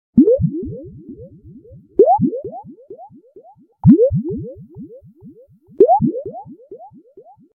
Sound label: Bubbles